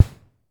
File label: Snare Reverb, Vermona DRM1-MK2